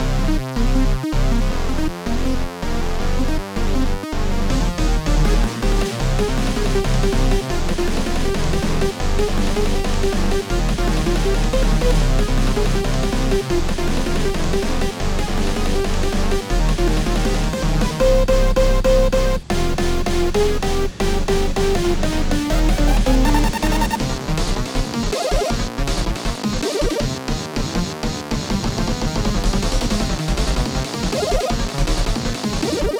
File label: Aggressive Dragon ループ